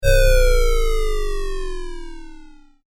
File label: Power down, Power Down Of Scifi Electronic Device Or Machine; Control Panels